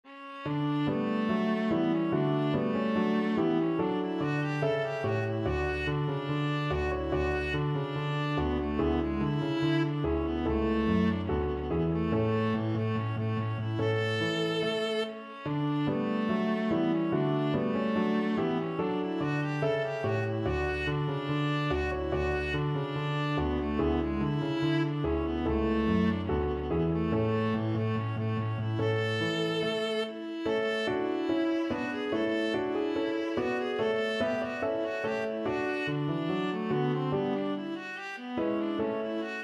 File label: viola